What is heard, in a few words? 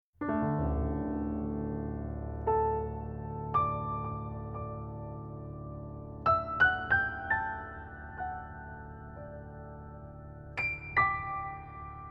Candlelight Piano, Soft Instrumentals; Shenandoah